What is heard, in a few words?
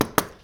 two knocks